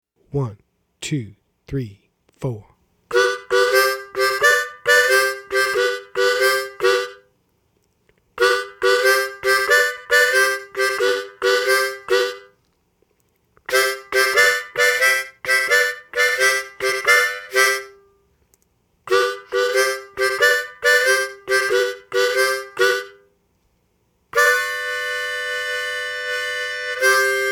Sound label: A Blues Shuffle Slow